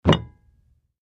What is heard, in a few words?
Beer Mug Thump Clinks; mug drinking